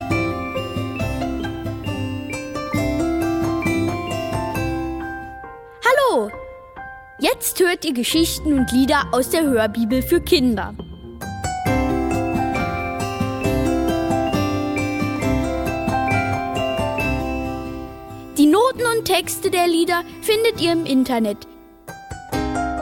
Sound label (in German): Musikalische Einleitung